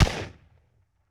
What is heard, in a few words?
Benelli 12 Gauge Shotgun Blast; Sony Pictures Sound Effects Series Volumes 1-10; Sony Creative Software